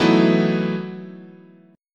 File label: E Major 7th Suspended 2nd Suspended 4th, chord